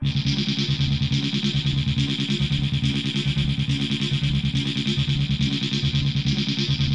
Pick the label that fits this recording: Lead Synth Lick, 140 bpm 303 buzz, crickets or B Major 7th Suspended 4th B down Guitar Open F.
140 bpm 303 buzz